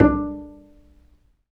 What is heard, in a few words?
Bass, Roland